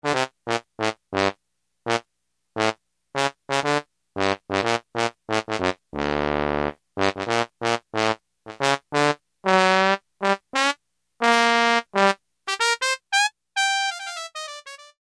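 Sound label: Trumpet Trombone, Roland U-110; Factory Patch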